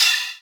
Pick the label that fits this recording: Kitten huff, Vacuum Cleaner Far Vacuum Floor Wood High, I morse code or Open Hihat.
Open Hihat